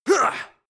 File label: male fight short; menschen; schreie